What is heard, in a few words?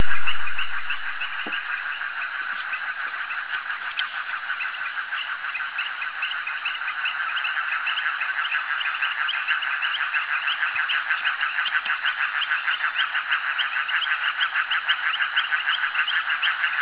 frogs at jetty; Camp Leakey; Frogs by Camp Leakey jetty at 1946hrs